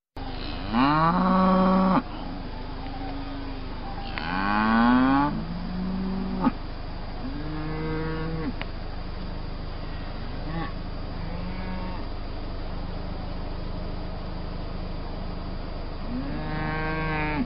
Cows Mooing

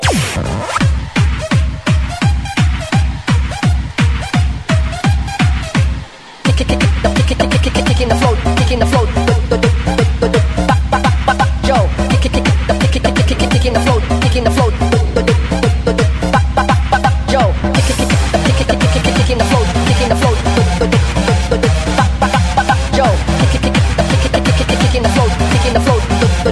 Disco Remix

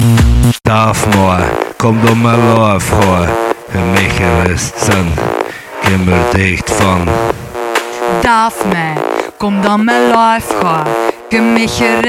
Carnaval

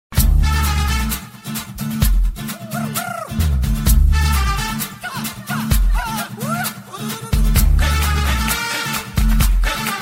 Trumpet

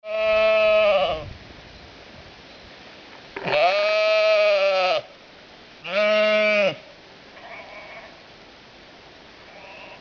Sheeps

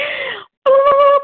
Weird Noise

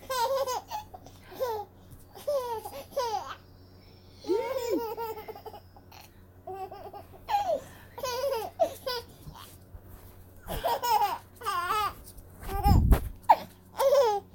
Sons laugh

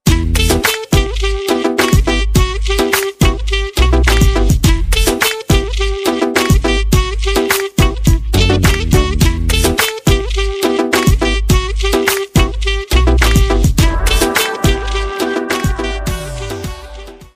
Cool Dance Tone

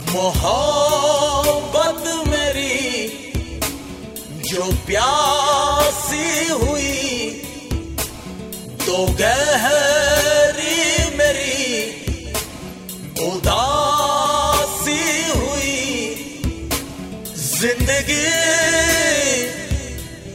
Sad Tone; Viraniya

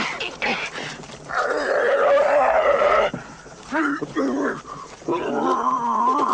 The Growling

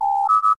doorbell; Voyager

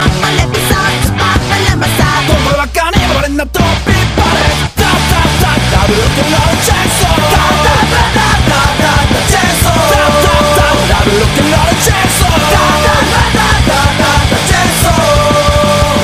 Rock N Roll Chainsaw

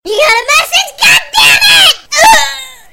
Ughh

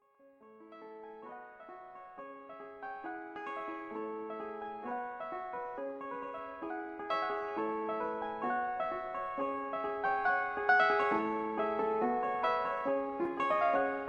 Piano Theme

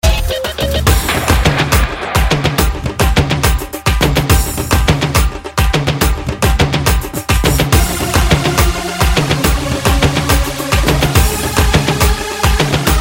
Beats

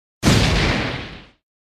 Explosion; Katsuki

